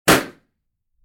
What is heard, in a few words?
balloon burst